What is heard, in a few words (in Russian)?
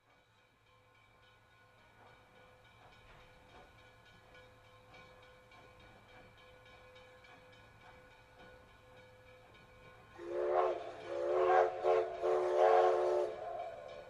poezda